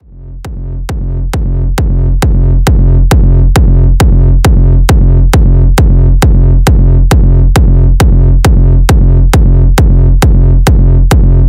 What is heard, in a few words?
signature kicks; zumstein